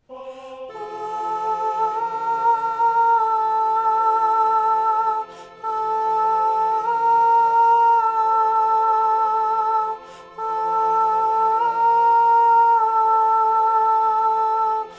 alto

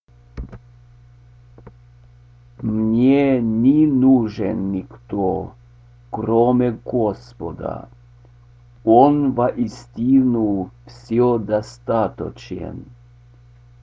satb, russian; god is sufficient

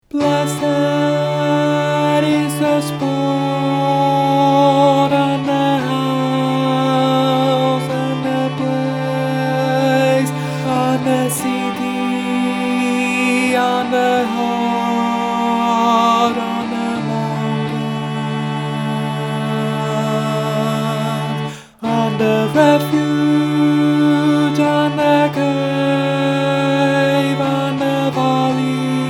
tenor

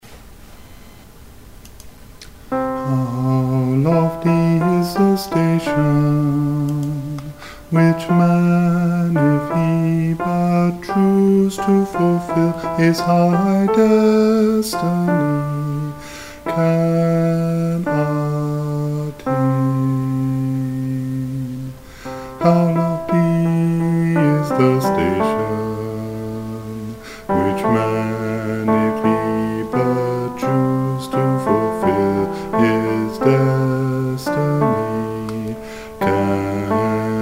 tenor voice

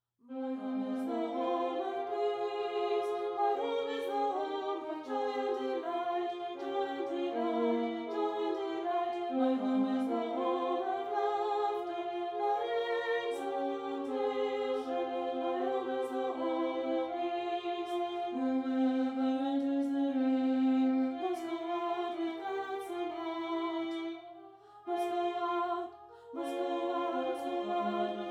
soprano

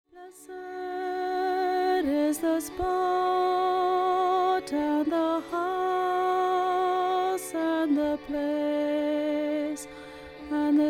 alto

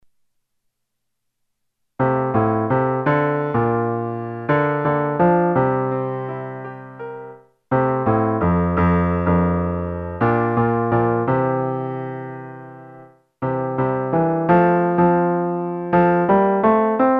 bass instrumental

